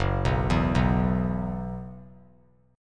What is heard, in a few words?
F sharp 7th Suspended 4th; F#7sus4 arpeggiated